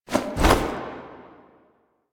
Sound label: Mount Balloon Jump; Drifter; Warframe; The New War